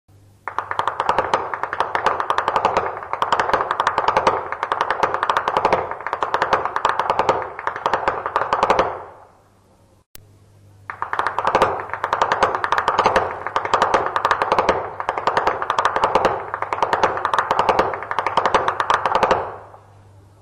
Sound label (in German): schnalzen; saaldorf